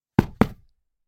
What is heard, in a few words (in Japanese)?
布をポンポン叩く音